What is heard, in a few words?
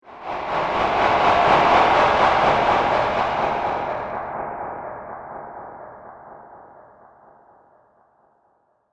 Whoosh The Darkness; horror sound effect; Andrew Fleming